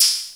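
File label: techno ride; USB Soundscan vol.11; Drums Dance & Groove; AKAI; TEKNO KIT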